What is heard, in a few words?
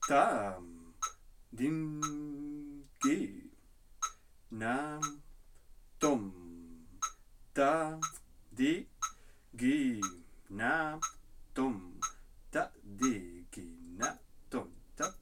tirmana tala 9 krama